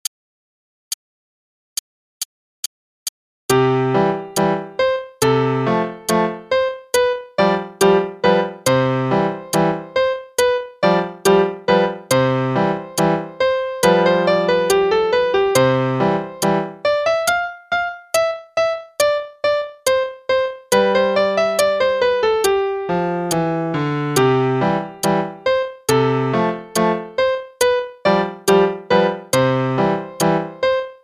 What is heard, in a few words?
Trumpet Concerto (qn=140); Faber; Adult All-in-One Book 1